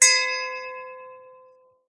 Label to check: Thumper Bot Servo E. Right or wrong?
Wrong